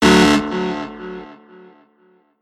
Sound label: notify